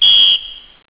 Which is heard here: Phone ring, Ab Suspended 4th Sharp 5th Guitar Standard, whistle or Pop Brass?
whistle